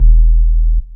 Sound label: DR. LOOP, BASS; X-Static Goldmine 3